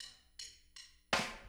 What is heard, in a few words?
Intro Kick, drums, 162 BPM; Drums from the Big Room The Mixes; Take Your Toms Off